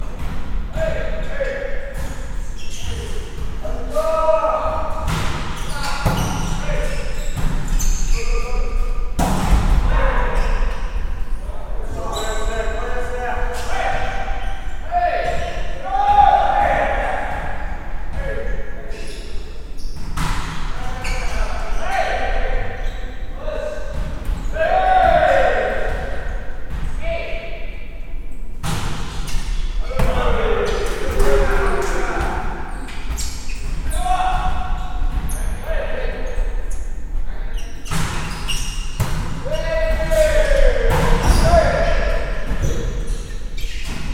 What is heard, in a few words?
mens vb